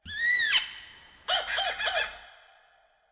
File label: rock birds; ambient; World of Tanks Blitz